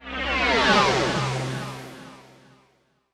Tonal FX C

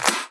Claps & Snares

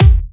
Bassdrums

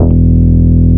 Root E, Bass

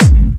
Bassdrums Clubby Kicks; Vengeance Samples

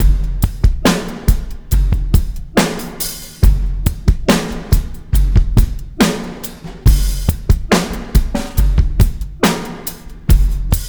Slomo Beat 140 BPM; Loops; vengeance essential club sounds-3; Slow Motion Beats

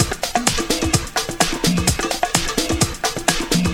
Latin House Loop 128 BPM; Vengeance Samples

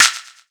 Percussion

